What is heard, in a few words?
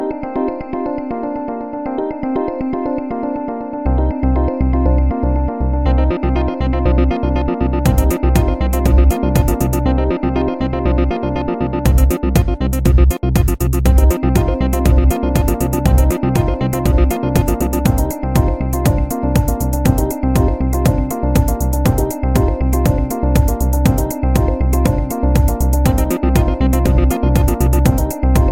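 Electro Drums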